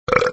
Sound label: Burp